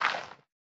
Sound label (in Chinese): 堆肥桶填充声